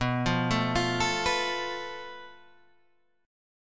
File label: F Major Flat 5th B